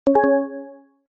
notificacion sencilla para whatsapp

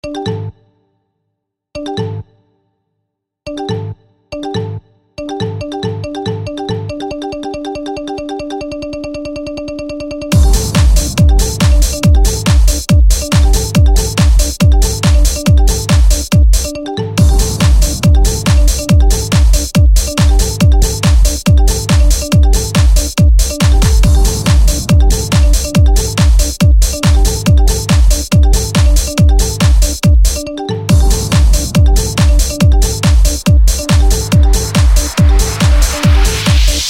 Tono cancion chat

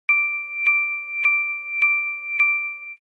tono aviso con pitidos; whatsapp

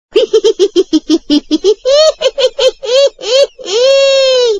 tono notificacion risa pilla; whatsapp

